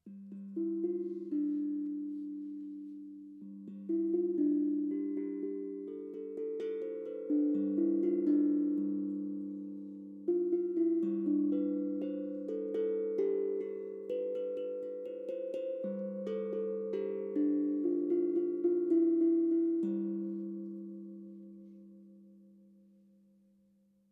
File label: Tong Drum G Min